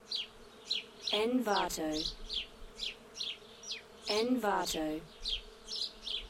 birds and bees

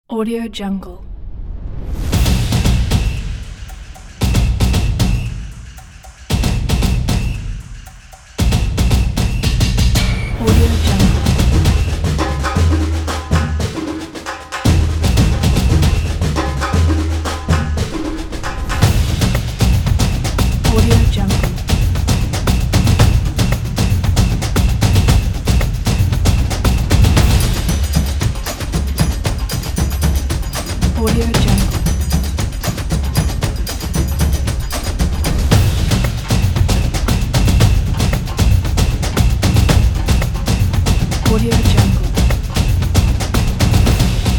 samba

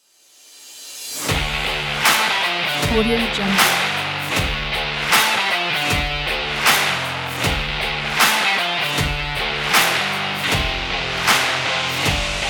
sport rock guitars background

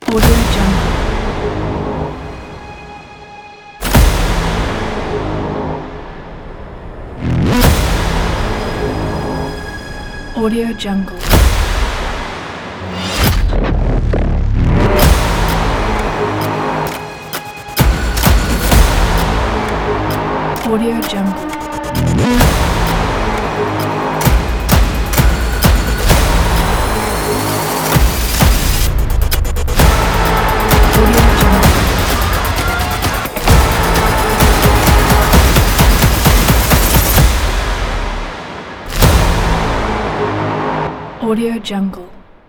countdown action movie horror trailer

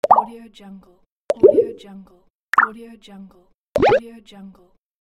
pop

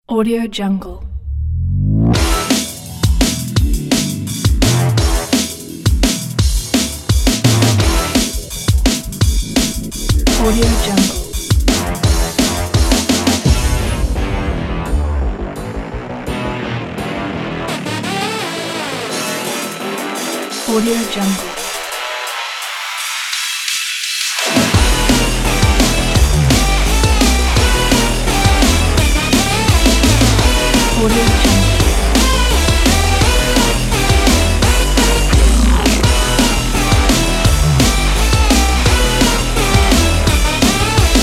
drum and bass punks

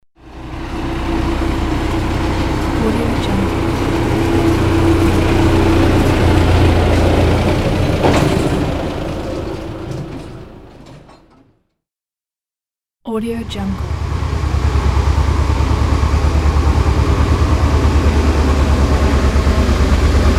industrial machine pack

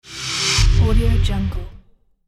vanish swish fx; astralaudio

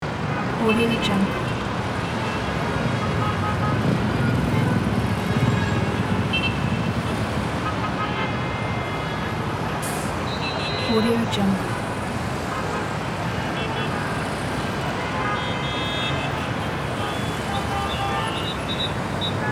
big city traffic

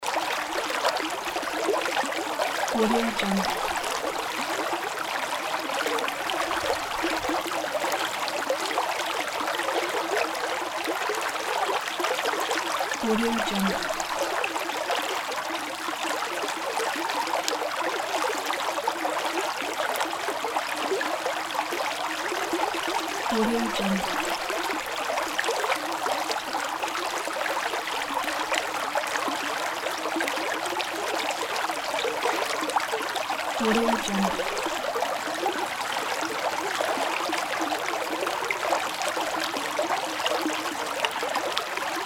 water stream; soundfood

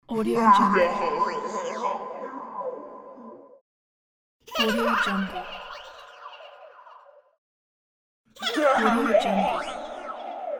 creature laughing

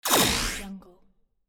laser burst buff

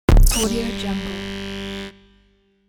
plug

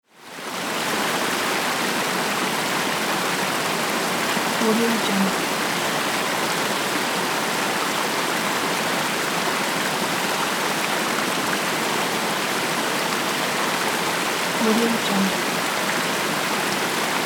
forest stream small waterfall close up

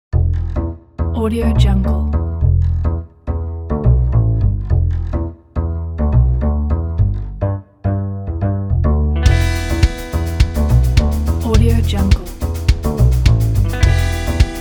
funk groove

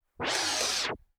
pneumatic squish open close forward reverse

